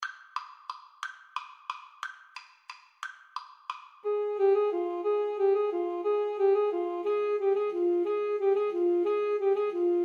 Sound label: Alto Tenor Sax Duet